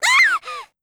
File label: eeeiiihhh!